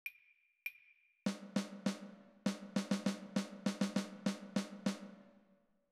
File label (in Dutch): luisterdictee voorbeeld